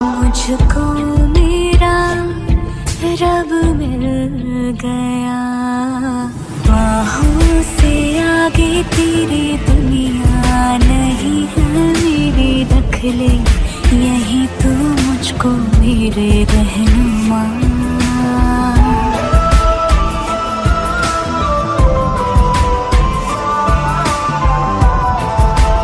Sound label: Slowed And Reverb